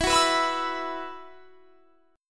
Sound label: E Suspended 2nd E, down; Esus2; strummed